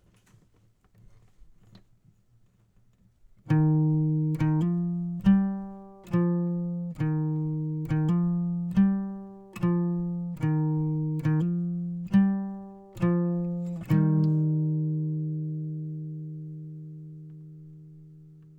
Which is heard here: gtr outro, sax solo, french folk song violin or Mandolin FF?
gtr outro